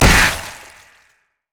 basic attack oh; LoL; vladimir

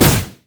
teleport; LoL; diana

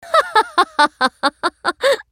laugh; Ashe

